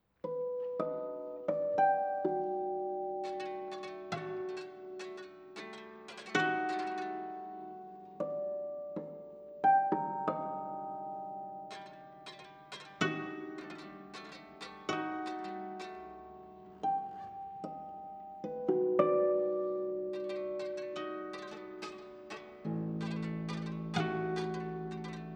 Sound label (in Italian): Arpa